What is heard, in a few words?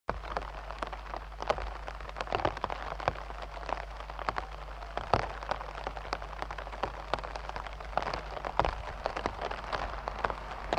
Rain Umbrella Some Di, int